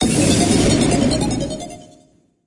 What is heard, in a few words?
flame bonus